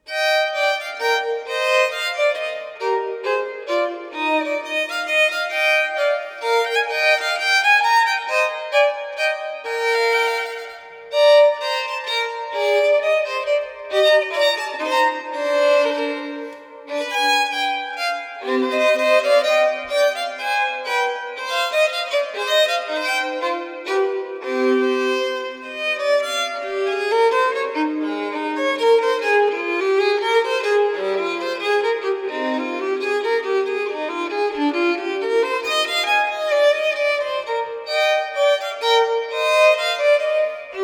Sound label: Partita no. 3 in E major Menuet; Bach; BWV 1006; VI. Menuet I da capo